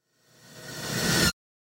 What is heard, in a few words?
Fast Horror Riser; SoundsCrate